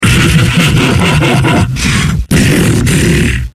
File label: fleshpound alert; slender